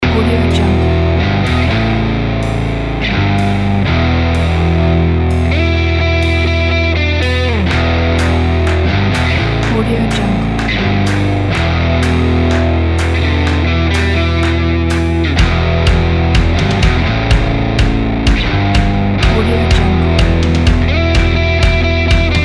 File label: hard rock power pack